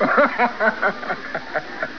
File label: laugh